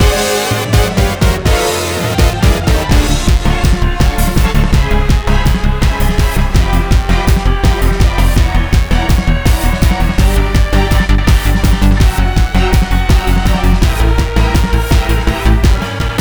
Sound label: Lava chase; Shantae Half-Genie Hero